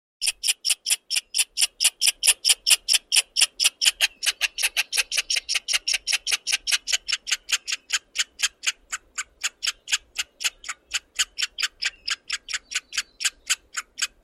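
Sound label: voice squirrel; belka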